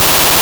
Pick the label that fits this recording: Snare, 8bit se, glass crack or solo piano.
Snare